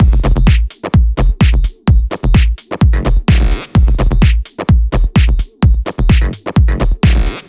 128BPM Air Raid Kit

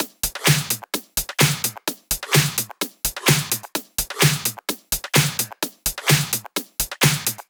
128BPM Drums; Sunday; Vengeance Samples; Sunday Kit

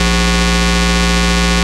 Root A#, Ultimate Bass; Vengeance Samples

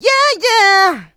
vocal house adlibs; PS-28 Vocal House Samples

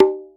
Bongo; Vengeance Samples; VEH1 Percussion

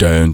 Vocal Oneshots; Vengeance Samples; Vocals

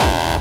BD Distortion, Bassdrums; Vengeance Samples

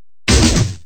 Loops Fills; Vengeance Samples

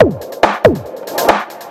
Breakbeat; Vengeance Samples; VEC2 Loops

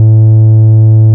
Bass Root A; Vengeance Samples; Vengeance Ultimate Bass

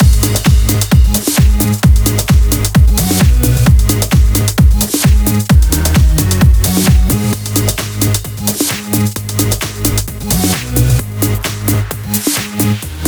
131BPM Mix; VFH1 131BPM Summertime Kit

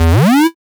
Synth Shot; Vengeance Samples

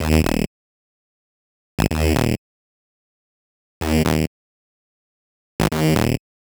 126BPM Lead Root D#; Vengeance Samples; Lineup Kit

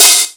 Open Hihats, Cymbals; Vengeance Samples

